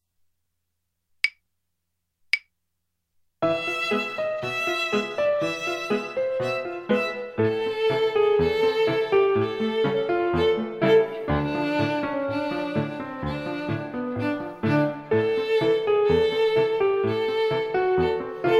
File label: Milonga